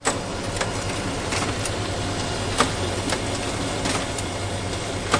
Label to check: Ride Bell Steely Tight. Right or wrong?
Wrong